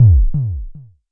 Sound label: Jomox MBase, drum machine; Jomox MBase 01